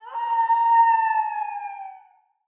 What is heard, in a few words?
howl; the wolfs night